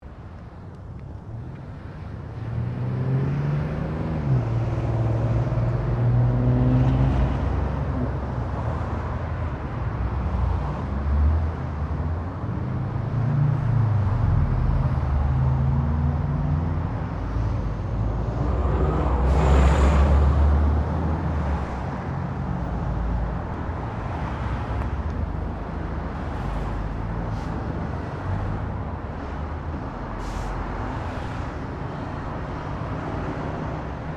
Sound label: Traffic Cars General Pass By; General traffic sounds; Traffic and Cars